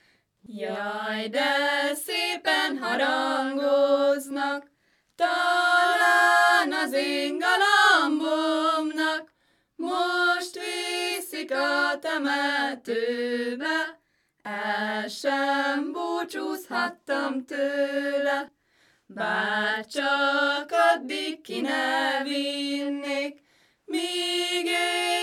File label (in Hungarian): nepdal; jaj de szépen harangoznak